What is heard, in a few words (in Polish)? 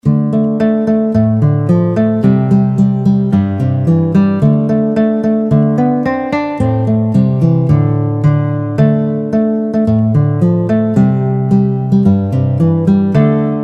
Morskie Opowiesci gitara